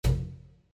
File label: reel Stop; Shamans Dream 2